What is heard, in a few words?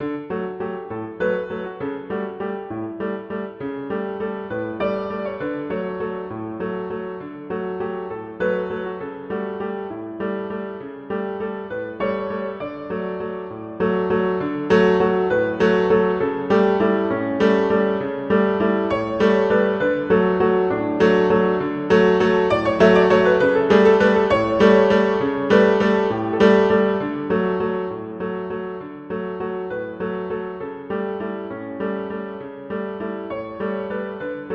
Italian Song; Tchaikovsky